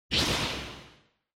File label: Motion Speed Lines Short